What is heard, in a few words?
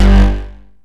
Synth Hit G